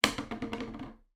card tube drop on table; foley

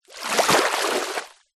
nature water shallow splash creek foot movement

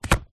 household rubber glove pull finger release

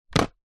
skateboard plastic set down on street; sport

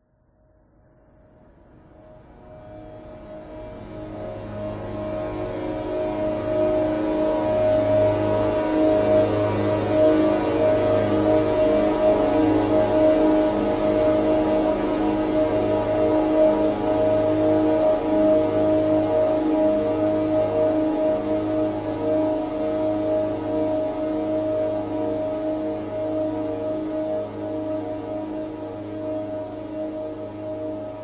horror swell long drone dark mysterious sinister

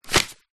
paper sheets handful set down on surface hard; zapsplat; foley

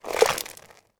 water soapy movement hand; zapsplat

